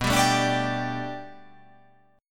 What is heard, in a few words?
B Minor 7th Sharp 5th B, chord